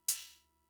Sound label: Cymbal; Fricke MFB-522